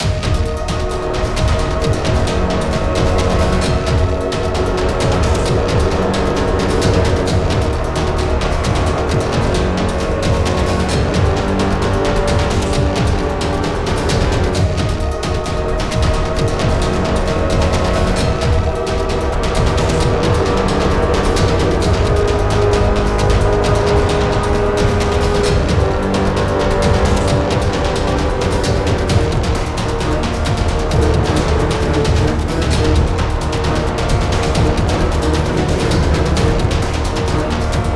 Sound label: Cave MiniBoss; skykeepers; ps4 windows xbox one gamerip 2017